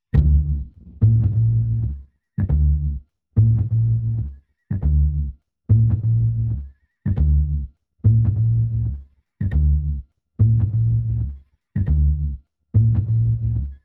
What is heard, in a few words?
bass